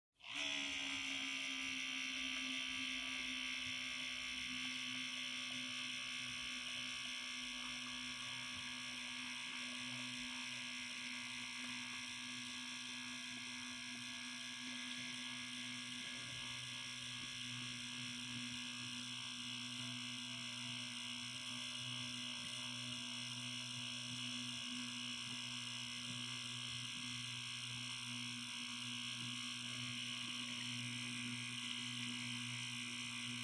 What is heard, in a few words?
Music Box-Foley (Drive)